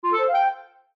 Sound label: Corked Message, Clarinet; HTC